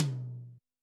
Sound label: TOM, Yamaha SY85 Drums